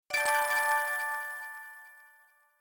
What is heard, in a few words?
Gettreasure Crystals; Super Mombo Quest